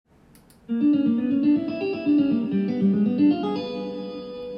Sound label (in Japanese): jazz fure zu